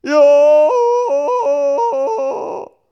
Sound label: Male Falling Yodelling Voice